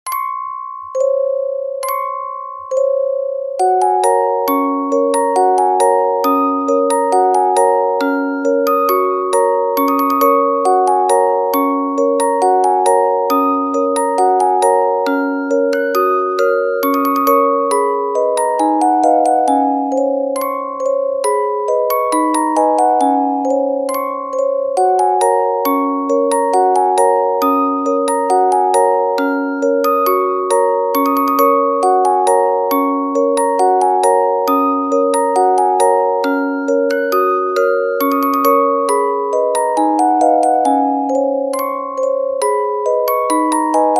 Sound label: orgel